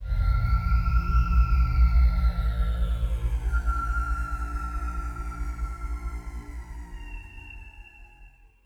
Tonal FX A#

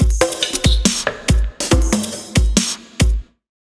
Drumloop; Ghosthack

